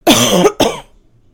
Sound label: coughing